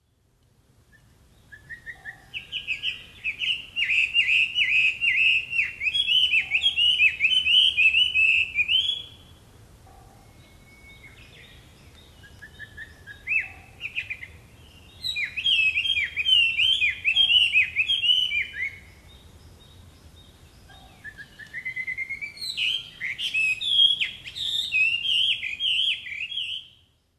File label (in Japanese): gabicho, hino, koe